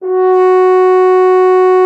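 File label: French horn F#4; Xsample VOL-3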